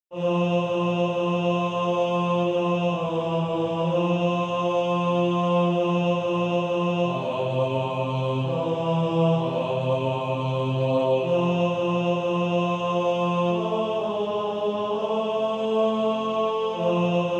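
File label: Male Choir, tenor 1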